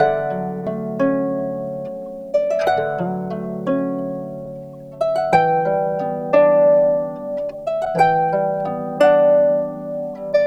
Harp Loop Dry 90 BPM D Maj; Melodics; Cymatics - Eternity Sample Pack

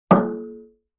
Barrel Hit Resonant, Vintage Cartoon; Drums & Percussion

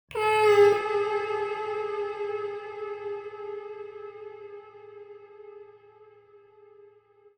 130bpm Am, Vocalpack

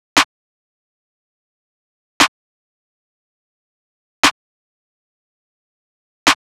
Drum Loop - 118 BPM Snare, Hip Hop; Drum Loops; Loop Stems; Cymatics; Nueva Drum Loop

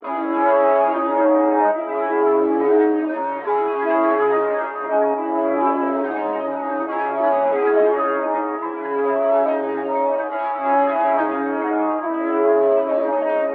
140 BPM C# Min Synth Brass, loop stems; Cymatics; 2022 Melody Collection Platinum Expansion - Pt 1; Madness

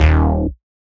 Bass Shot D#

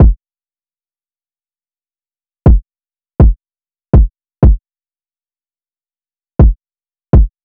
Drum Loop, 122 BPM Kick; Cymatics; Vibes II Drum Loop Collection; Connection Drum Loop

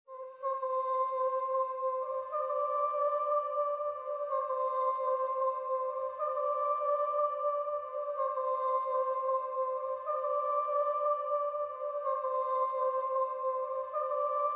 124 BPM C Maj Vocals; Melody; Loop Stems; Cymatics; 2022 Melody Collection Platinum Expansion; Peach Tea

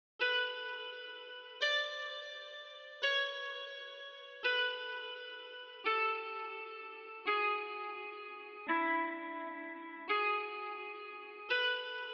85 BPM F Min Guitar; Loop Stems; Cymatics; Rushing

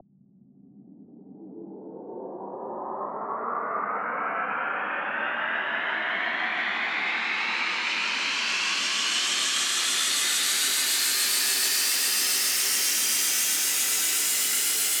Slow Burn White Noise Up; House; FX

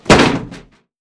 BF Onto Metal; Fairlight Sound Lib

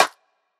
Rimshot, Snares; Drum One Shots; Cymatics; Eternity Sample Pack

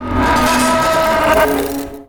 wooshes bent metal

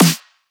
Snare

